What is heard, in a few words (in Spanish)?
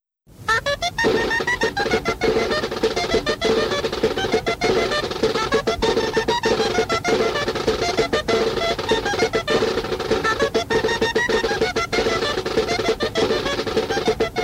Danza; Danza de "El zángano"